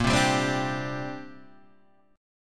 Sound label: D 7th Suspended 4th Sharp 5th, chord